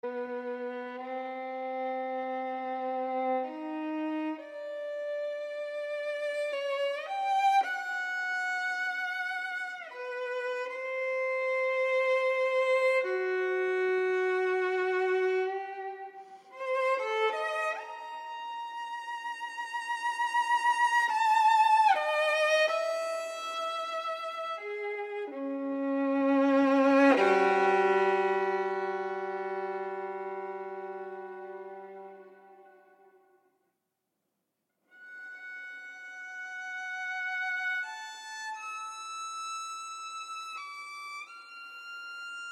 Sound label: Solo Violin; Elegia No. 2; Op. 6b